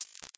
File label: glitch